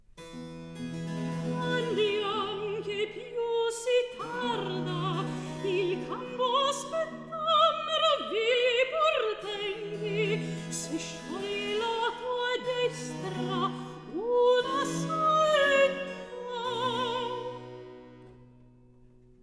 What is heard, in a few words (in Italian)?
Recitativo; Andiam, che piщ si tarda; Baroque; Alessandro Scarlatti; Duet Cantatas